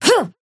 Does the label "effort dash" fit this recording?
Yes